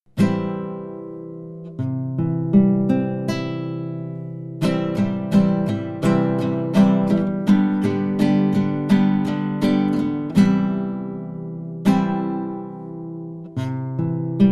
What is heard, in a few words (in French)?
echauffement guitare